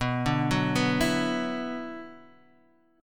B Minor Add 11th B, chord; Bmadd11 arpeggiated; madd11:1,b3,(5),11; JGuitar

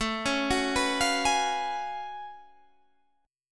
F sharp Minor Major 11th A

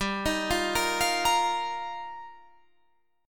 G Minor 7th Sharp 5th G, Guitar Half Step Down

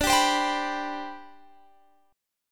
D 13th Suspended 4th D; D13sus4 strummed

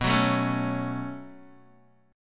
B 7th Suspended 2nd, down, Guitar; B7sus2; strummed; JGuitar

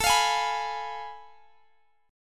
F Diminished A; strummed

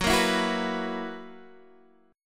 F sharp 11th F sharp Guitar Half Step Down

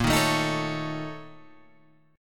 A sharp Minor Major 7th Double Flat 5th A sharp

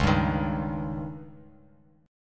Db Suspended 2nd Flat 5th, Bass Standard; strummed; JGuitar

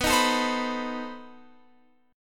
B 7th Flat 9th B Guitar Open F; B7b9; strummed

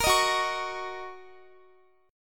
Gb 6th, Guitar; Listen to Gb6 strummed

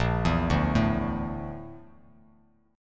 A sharp Major 7th Flat 5th A sharp Bass Standard; A#M7b5; arpeggiated; JGuitar

